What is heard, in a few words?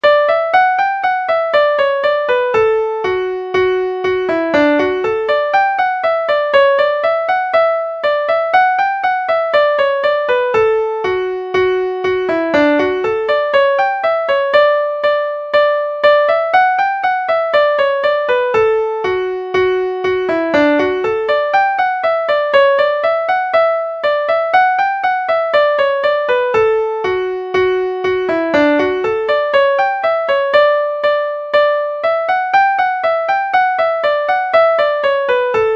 french hornpipe, mandolin